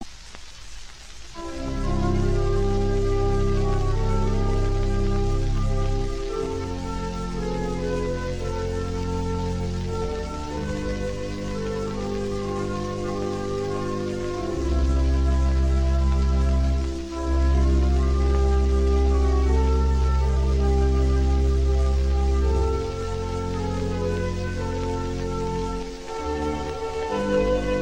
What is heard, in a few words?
organ solo